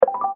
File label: play pause; phet io wrapper sonification